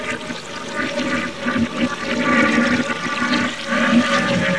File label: well drain; keenhalloween